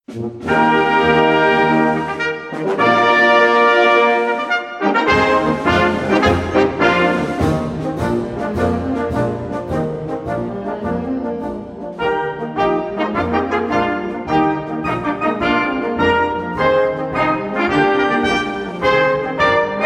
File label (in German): Marsch; Konzertmarsch